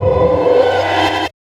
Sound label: horror scream rise; soundscrate